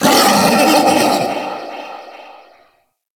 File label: Feral Vocal Call; Enemies; Kubrow; Warframe